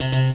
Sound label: mech sound